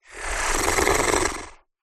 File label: animals horse snort human; zapsplat